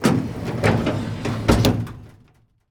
Morgue Drawer Open; Medical; Blastwave

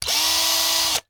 Cordless Electric Drill; Tools; 1001 Sound Effects

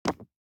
DICE on Neoprene, Drop, Standard, 5 Five Dice; Samples; ArticulatedSounds; DICE Sfx Party (Extended)

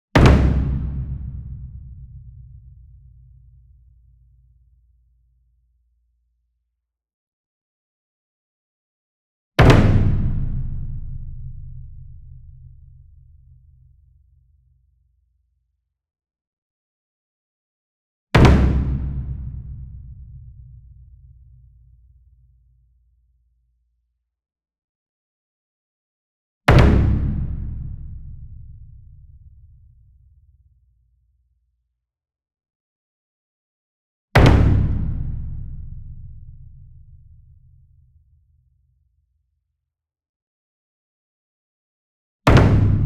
SINGLE DRUM Gong Double Hard Beater Medium; boom library; Cinematic Strikes Construction Kit

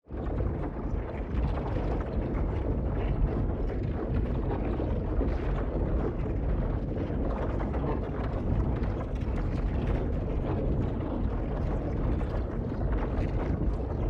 AMBIENCE Sunken Machinery; boom library; Matter

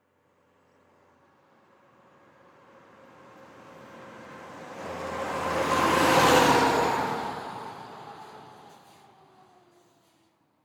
Semi Truck Mac; Blastwave

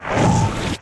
Pickup Fire; Pickups

